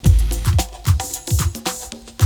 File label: PARTY LOOP, breakbeats